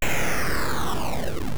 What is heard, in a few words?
explode